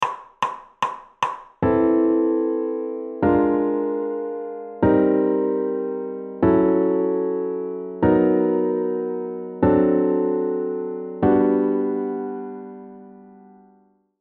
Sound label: piano